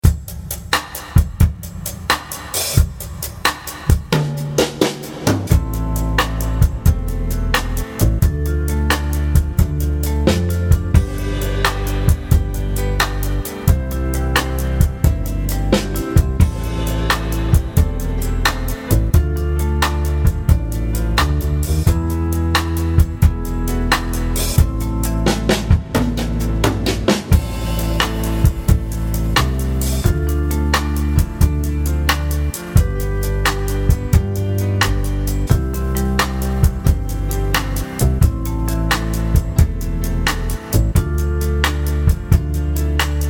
Playalong Akkordbrechung

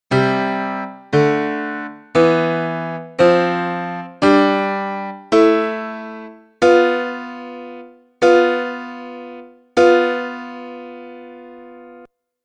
die falsche Quinte h f

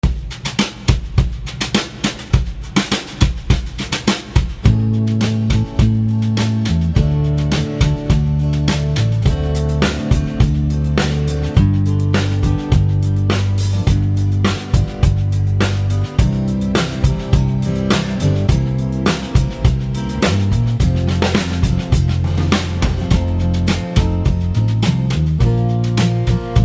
Playalong Quintenzirkel

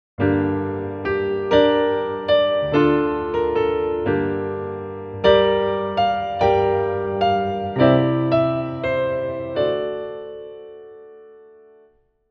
moll 4

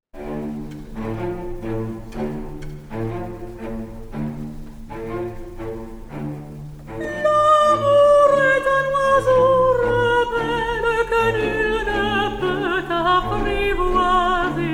berühmte chromatische Melodien

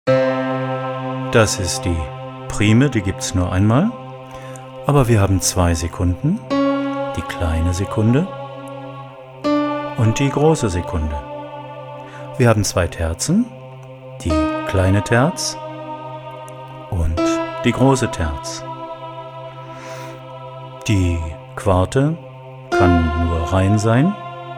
große und kleine Intervalle

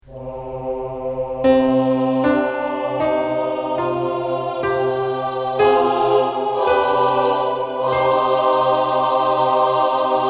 harmonisch Moll

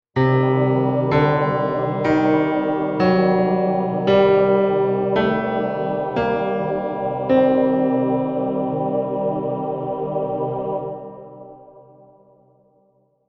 Balkan Moll

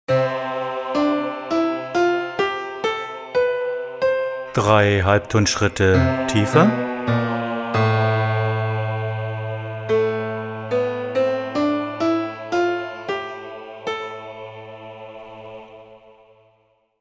C Dur und A Moll

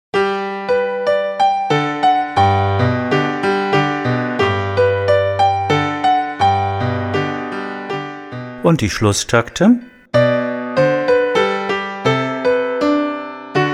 Menuett

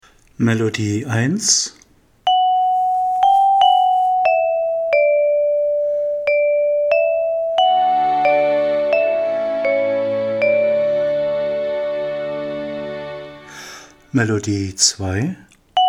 Melodie in D Dur und H Moll

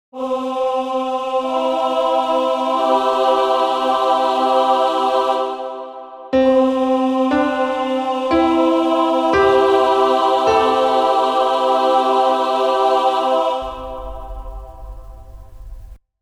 Pentatonik über dem Durakkord

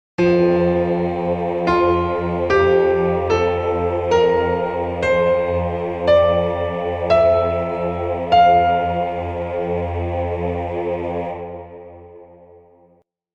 F Dur finden

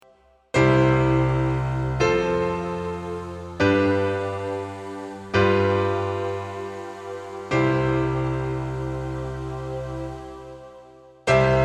Kadenz mit 7

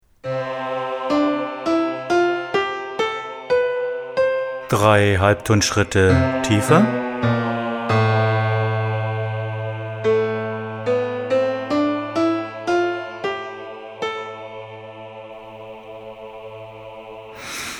Durtonleiter und paralleles Moll

